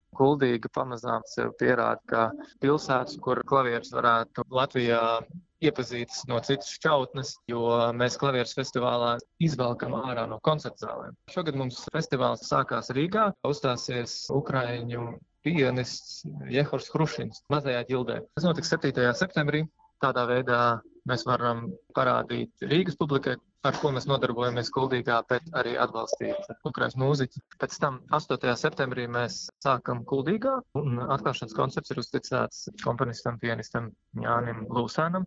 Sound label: piano; Kuldīga Piano; RADIO SKONTO